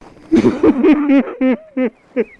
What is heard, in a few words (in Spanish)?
Llorando